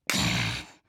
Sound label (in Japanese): くっ…！